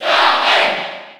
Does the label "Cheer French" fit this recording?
Yes